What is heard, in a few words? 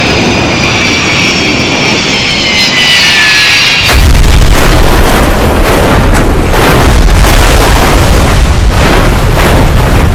crash; eurofly